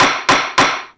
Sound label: triple gavel